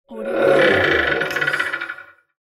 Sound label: stalled power supply